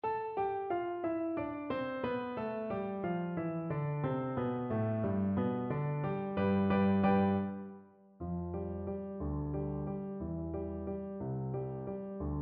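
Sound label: marimba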